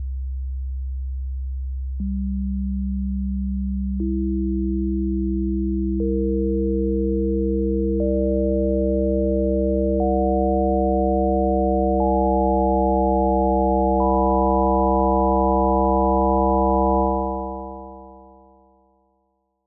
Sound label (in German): obertoene obertonreihe geradzahlige obertoene